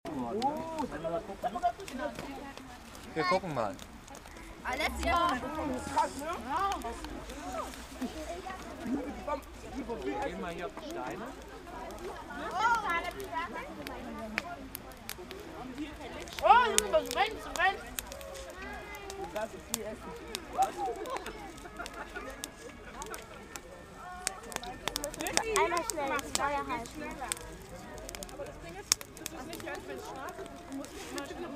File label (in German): Feueratmosphäre; Menzeldorf